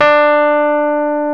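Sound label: piano Rhodes Mk.V; Roland LCDP10 Keys of the 60s and 70s 2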